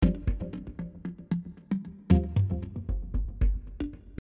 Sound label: Mallimban Cm7 115 Muted